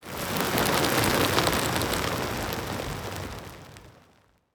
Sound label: impacts; World of Tanks